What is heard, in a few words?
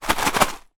food cereal pack small fun size shake; zapsplat